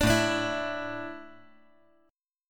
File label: A Suspended 4th A, chord